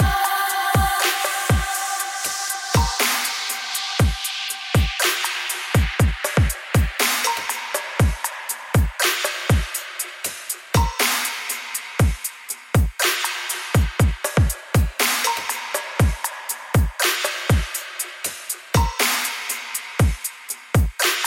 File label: Harmony beat